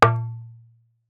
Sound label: darbuka note